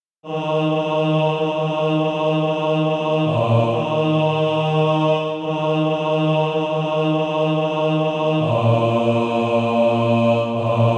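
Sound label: bass; Fly to Thy Refuge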